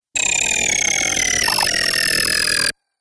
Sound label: Glitch Future Funked; Glitch Sound Effects; sound design